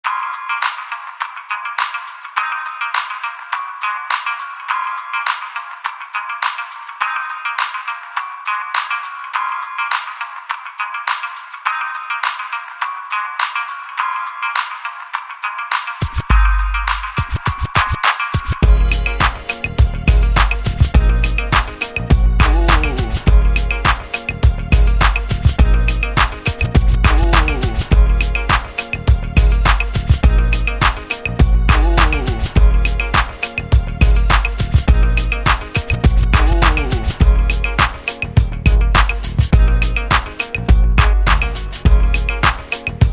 instrumental

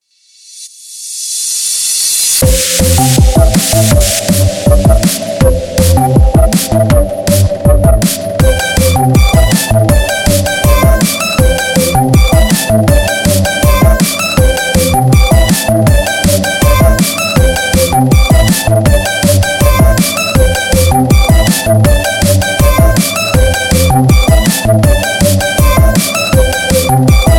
bells

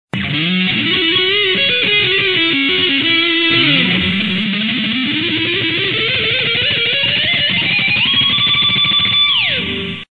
a bluesy shred

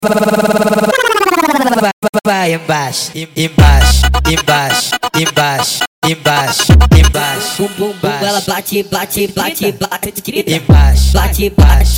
Beat

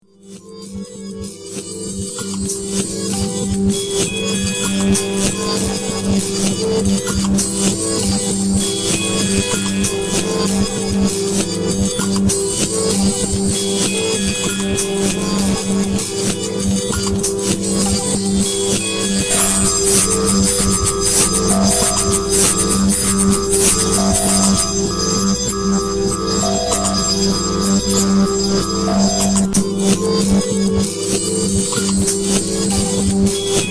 instrumental; Ocupado

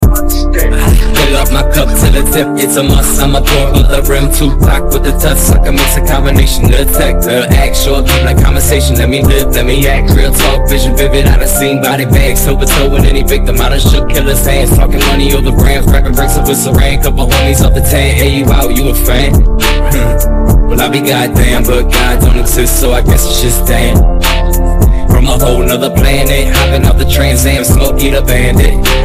Trap Mix

